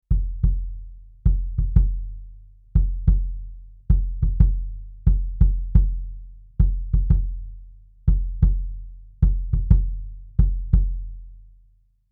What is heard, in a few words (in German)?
Bass Drum Hyper Niere; sE Electronics T2